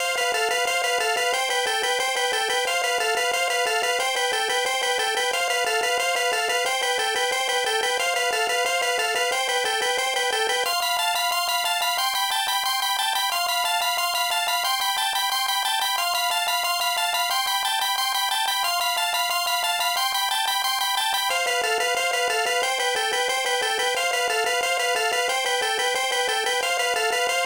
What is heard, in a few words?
Boss BGM, X68000; TwinBee